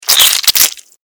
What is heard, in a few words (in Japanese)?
クリーチャーが食べる音